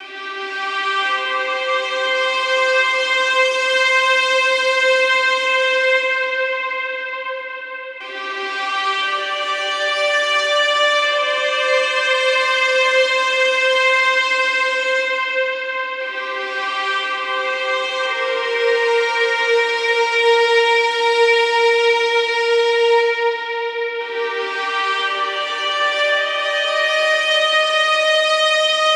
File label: Violin - Cm 60Bpm; Construction Kits; STEMS; Ghosthack; SkyRealm; Kit 06 - Before The Storm